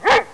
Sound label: DOG; 特酷声音素材库